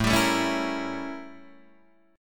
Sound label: A 7th Flat 9th Guitar Open D